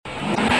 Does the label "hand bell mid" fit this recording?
No